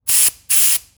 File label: Industry Tool Air Compressor Blow Air Two Times